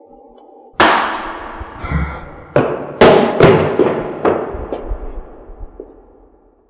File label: scream